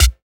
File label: DRM OLD, dance synth